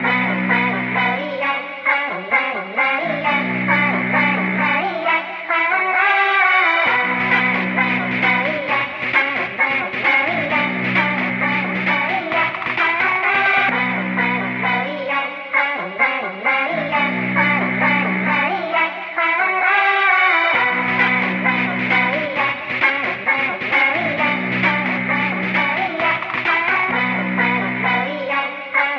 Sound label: Marching Chipmunks